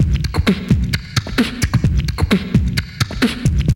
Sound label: break; Zero G - Man Machine